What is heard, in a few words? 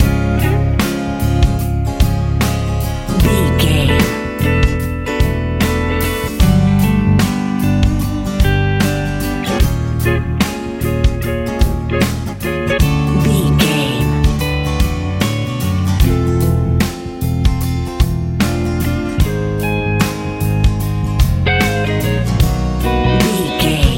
pop rock